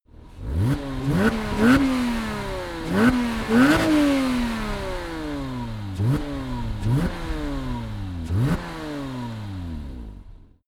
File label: sports car porsche boxster rev multiple exterior